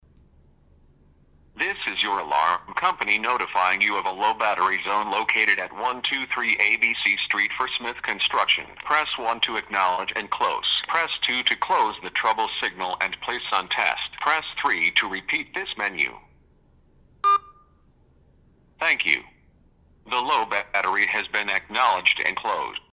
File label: Low Battery; SouthwestDispatch